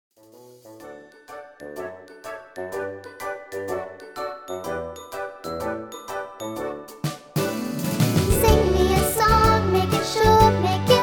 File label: Singing All Over The World